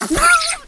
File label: virus dead; kill the virus